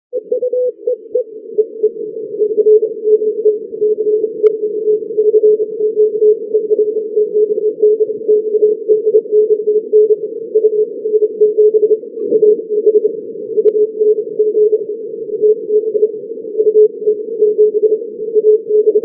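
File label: 40m short path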